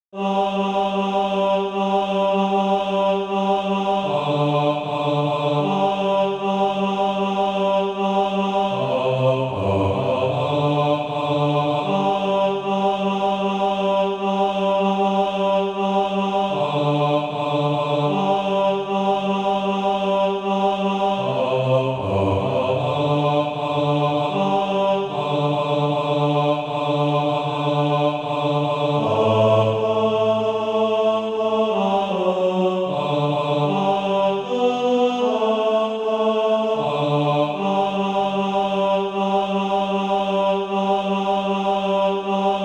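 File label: bass, Practise Music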